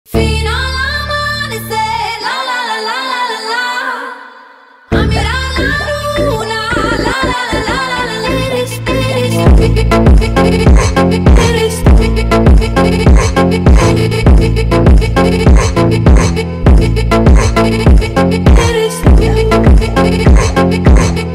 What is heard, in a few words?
Funk Song